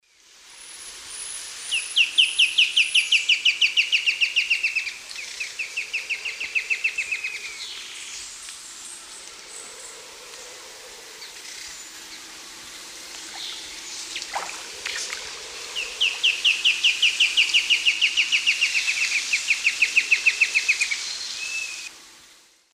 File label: Myrmotherula ignota, duet and call; S. America; Eastern Lowlands